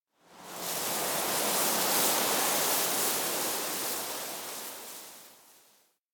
grass rustle; anemoi

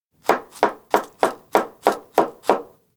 knife chop; anemoi

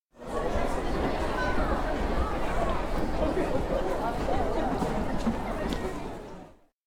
crowd murmur; anemoi

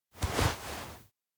kigurumi peek head; anemoi

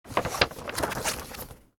paper map spread; anemoi

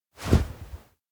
turn; awayuki; anemoi

